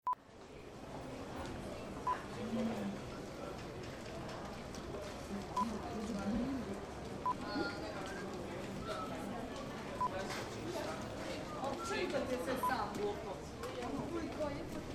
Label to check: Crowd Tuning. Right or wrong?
Wrong